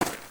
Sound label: Sheep Footstep; creatures organic